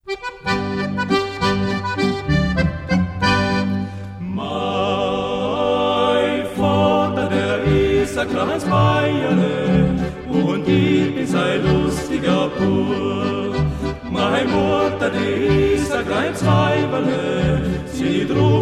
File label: quartette